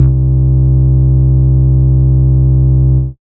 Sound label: Moog Bass, Dance Synths